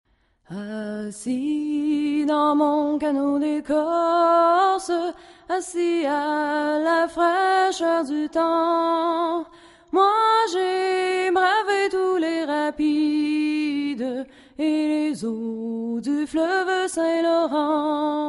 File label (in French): chant; mon p'tit canot